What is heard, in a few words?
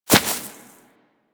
Rodent Hop Step Rear Grass; Characters; Foley; Warframe